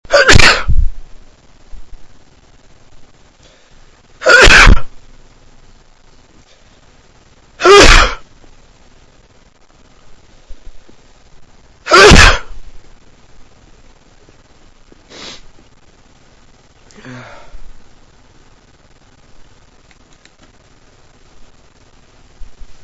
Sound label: dusting, male sneezer